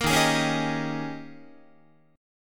D sharp 11th D sharp, Guitar; D#11 strummed

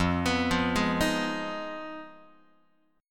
F Minor 7th Flat 5th, Guitar; arpeggiated; JGuitar

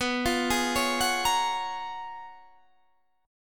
C sharp 13th B

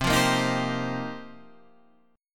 F 9th Suspended 4th C, chord

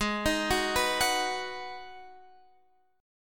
Ab Minor 7th, down, Guitar, Half Step Down

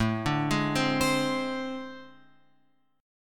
A Minor Major 7th Double Flat 5th; AmM7bb5 Chord {5 5 6 5 X 8} arpeggiated

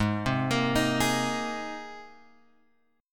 G sharp Major Add 9th, chord; G#add9 Chord {4 3 X 3 4 4} arpeggiated

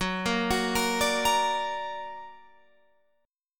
F sharp Augmented F sharp Guitar Open F; arpeggiated; 18,17,18,17,17,17; JGuitar

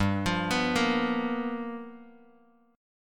G 7th Sharp 9th G chord, Bass Standard; G7#9 arpeggiated; JGuitar

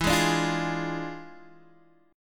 E 7th Flat 9th E down Guitar Open G

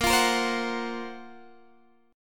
F sharp Major Sharp 11th A sharp

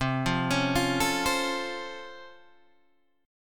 C sharp Augmented Major 7th C, chord

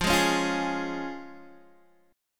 F Minor Sharp 5th F down Guitar Open D

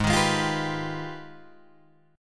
Ab Minor Major 13th, down, Guitar, DADGAD

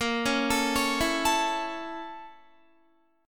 Bb Minor Major 7th Flat 5th, Guitar; BbmM7b5 arpeggiated

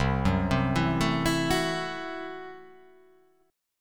C 6th Flat 5th C down

